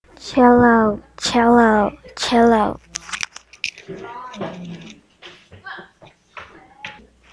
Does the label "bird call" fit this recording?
No